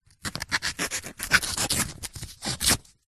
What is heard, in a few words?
foley paper cut slice open knife letter